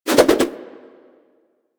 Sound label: Nunchaku Spin Whoosh; Warframe; Tenno